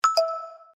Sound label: notification fade in sped up; xiaomi miui 10 stock notification; без слов, короткие; на смс; на уведомление